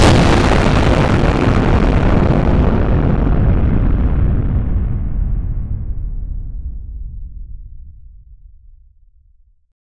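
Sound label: hydrothermal explosion; environmental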